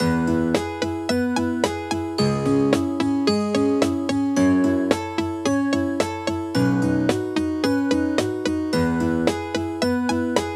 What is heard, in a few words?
day loop, music